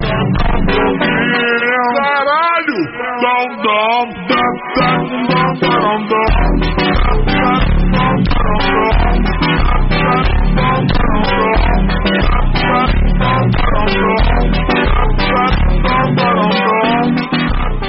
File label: phonk